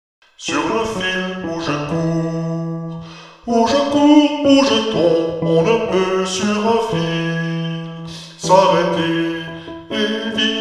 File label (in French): basse